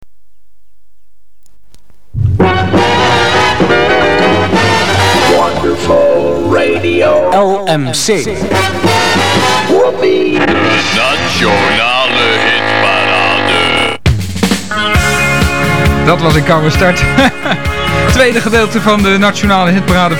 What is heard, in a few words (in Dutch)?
tune